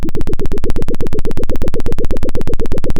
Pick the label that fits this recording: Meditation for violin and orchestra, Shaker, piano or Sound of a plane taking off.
Sound of a plane taking off